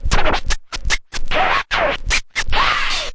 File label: turntable ah, Scratching and Turntablism; DRUM LOOPS and BREAKS